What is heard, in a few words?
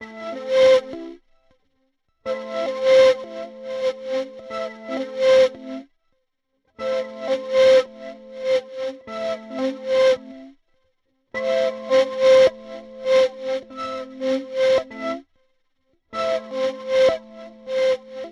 Beebo Resonance Band; Poly Effects